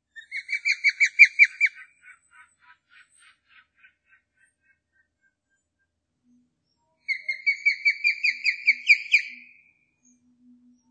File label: pandion haliaetus; fiskgjuse; osprey; Lätet är serier av skarpa visslingar, cheep, cheep eller yewk, yewk